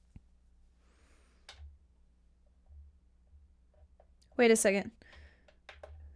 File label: Vox